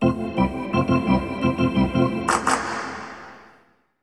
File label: Hockey Organ